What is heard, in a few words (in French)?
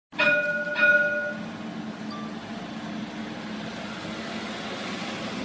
Le son de la cloche du tramway de Besancon; le lexique des moyens de transport